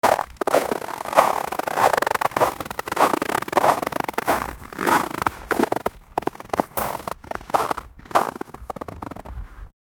sound of sled riding on

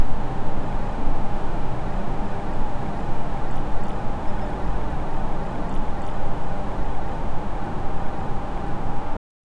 ambient sound of a luxuri; apartment

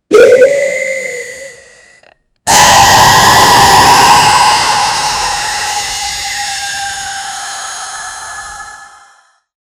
goblin

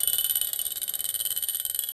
slot machine

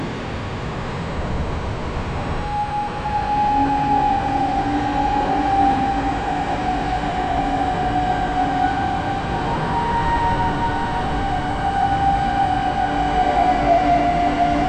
a desolate soundscape of, ruined

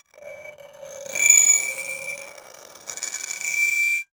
high pitched, ice skating

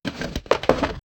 opening the lid of a, creak

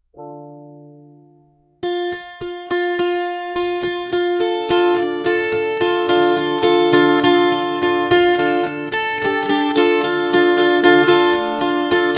all instruments

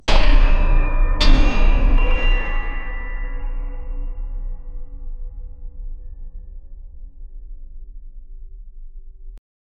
distinct metallic clanks, collide

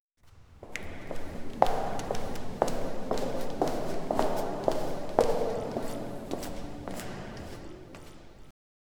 three distinct sets of fo, prison

